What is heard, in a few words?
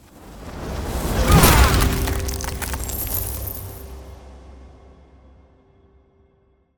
Ice Spell Damage Direct Frostclaw Swipe; Arctic Aura